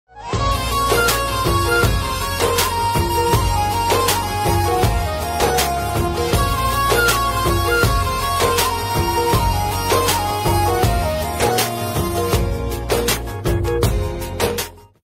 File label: love song ringtone